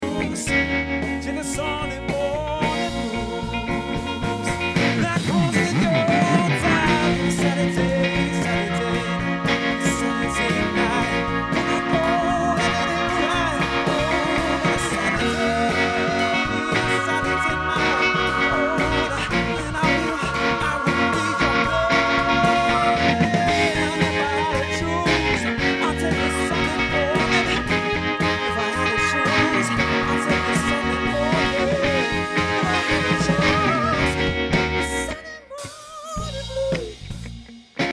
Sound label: Sunday Morning Blues Stripped